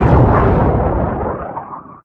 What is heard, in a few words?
Rocket Launch